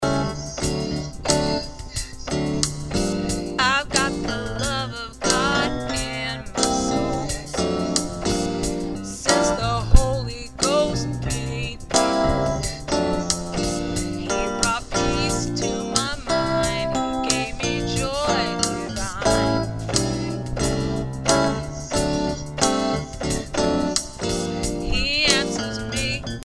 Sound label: Lead